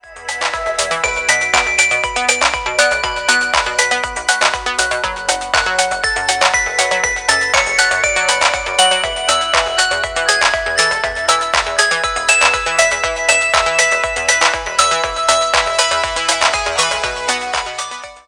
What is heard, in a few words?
bell, Ringtones